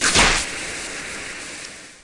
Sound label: creeper death; mob; minecraft; PixelPerfectionCE